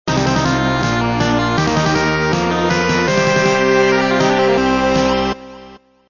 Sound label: Fanfare